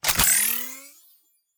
Charge, weapon; lancer weapon fx

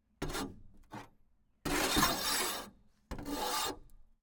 ext car impacts and scrapes

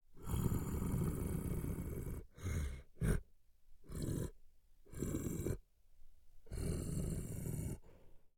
monster yeti groan; fvtt sonniss gdc audio

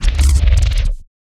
transition elastic; sonniss gdc

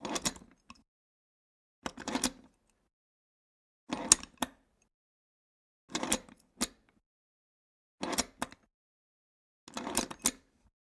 lock outdoor opening solid; sonniss gdc

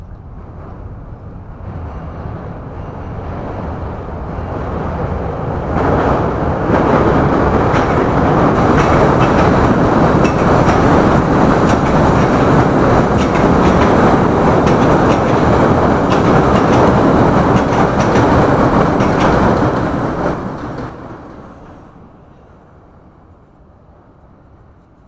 chicago l train underneath tracks passby; fvtt sonniss gdc audio

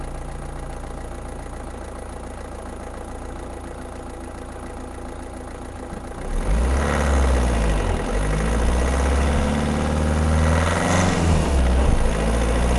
car driving short engine; sonniss gdc

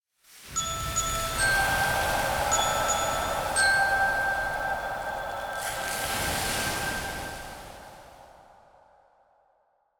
sleep, 1st level spells

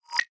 ease into position; fui

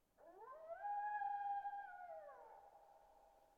coyotes; weather and nature

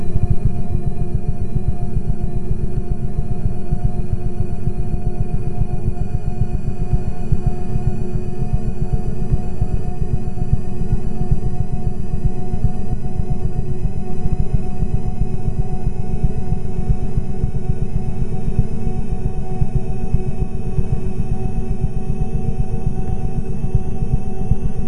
designed soundscape loop; sonniss gdc

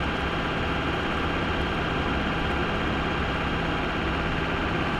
lexus lfa onb designed 2k rpm loop mono; sonniss gdc

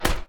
shield hit; Combat

